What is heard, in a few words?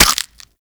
Infested Minion Bite